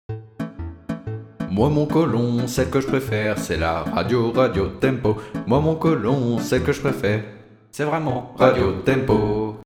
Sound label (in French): radio tempo solo